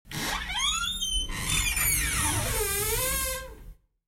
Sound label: squeaky door open